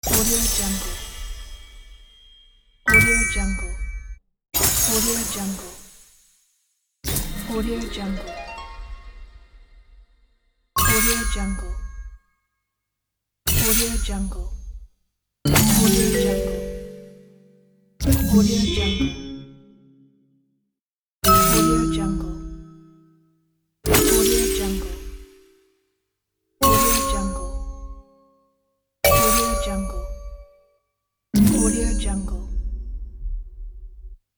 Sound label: casino sound effects